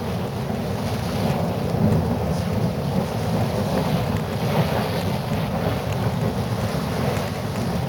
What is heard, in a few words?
Freedom Thrower Loop; Warframe; Caliber Chicks Minigame; Weapons